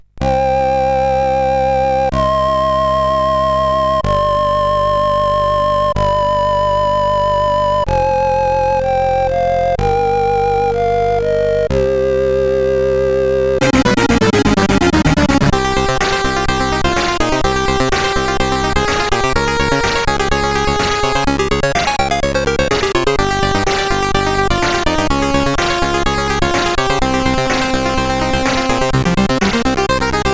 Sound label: sid CSG8580R5